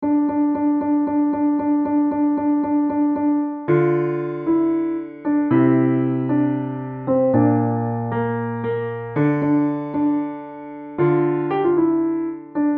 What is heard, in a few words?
solo, piano solo